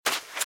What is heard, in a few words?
Footsteps - Sand - Dry - Run - Left; RadwinIsland